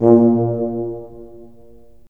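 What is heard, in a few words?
brass tuba; LA Composer vol. 3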